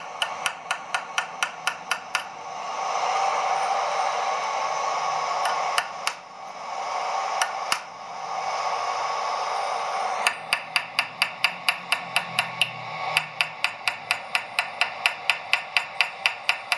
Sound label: sword maker in toledo spain